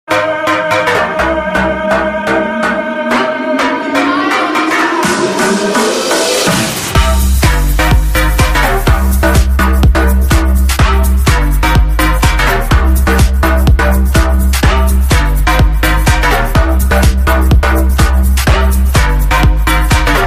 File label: best norwegian tune ringtone